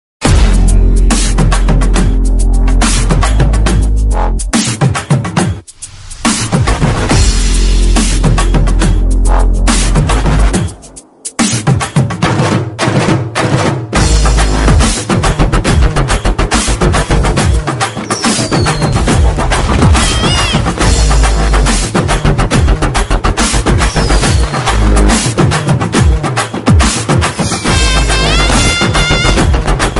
mass intro bgm; kodi

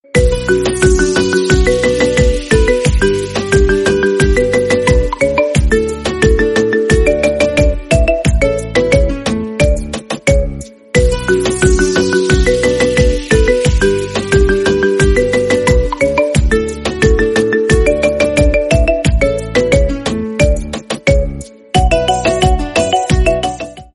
Marimba

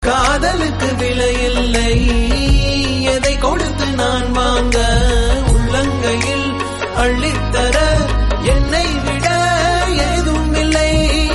Tamil song; anjaan

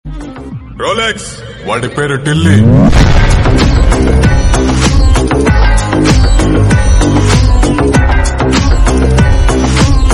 bgm kannada; rolex

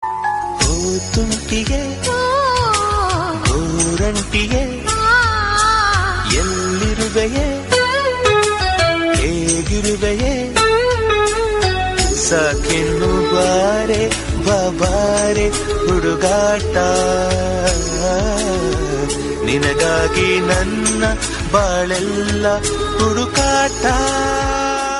kannada song

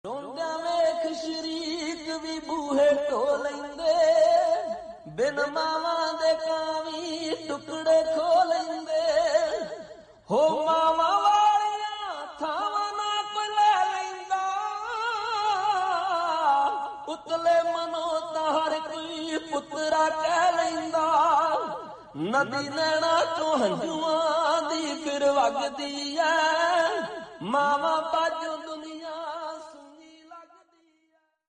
punjabi devotional song

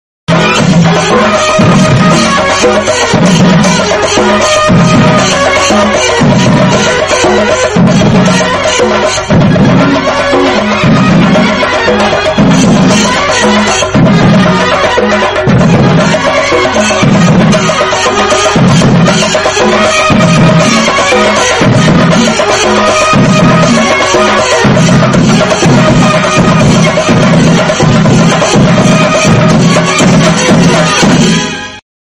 band music, background music

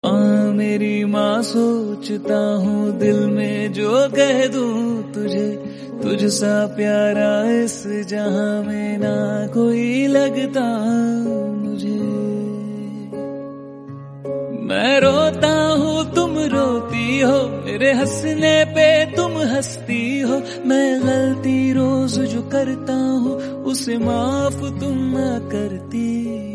romantic hindi

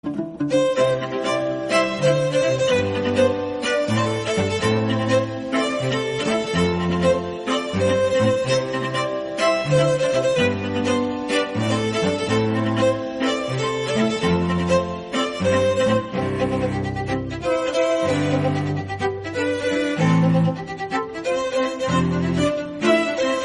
english instrumental

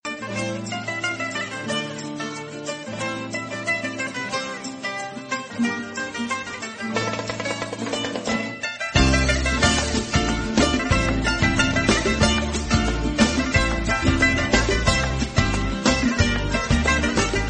greek music

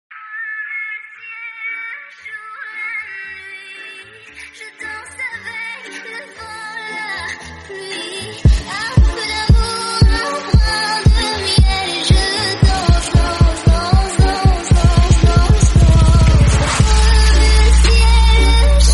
bass remix bgm

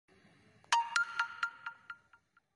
infinix bgm; infinix ocean

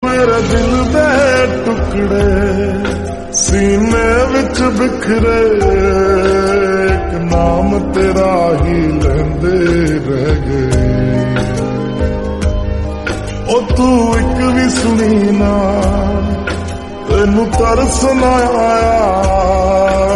slowed punjabi song

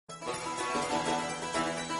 sms tone; attack on titan

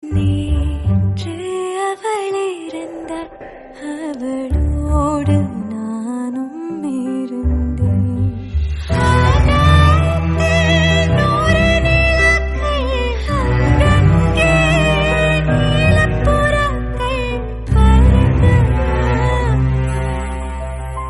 female version tamil; maryan

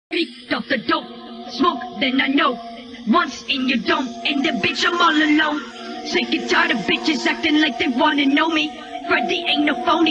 remix english song

